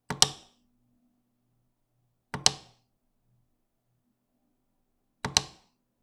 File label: Paddles